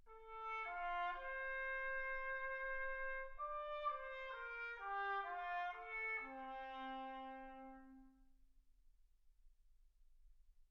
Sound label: trumpet